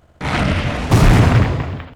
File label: Talaven Attack